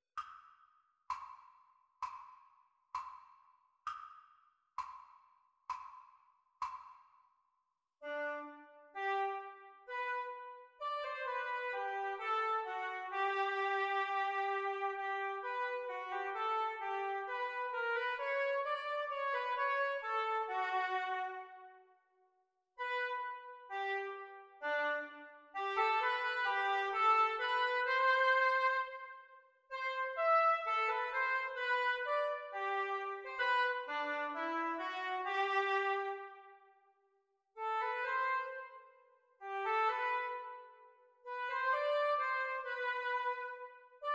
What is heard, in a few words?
oboe duets practice; practise speed